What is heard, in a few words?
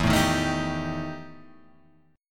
E Minor Major 7th Double Flat 5th, E, down; strummed; JGuitar